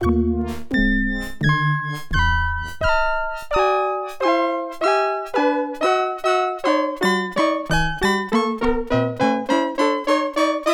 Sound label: Canon